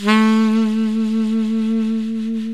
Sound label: BREATHY SAX; OMI - Universe of Sounds